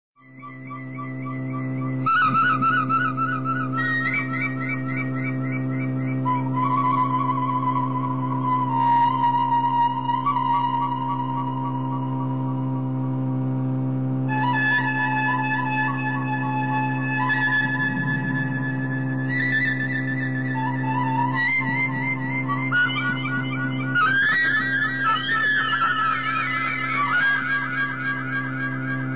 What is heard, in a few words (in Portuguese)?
sopro